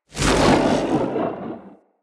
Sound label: Casting Device Projectile Whizz By Heavy; Weapons; Player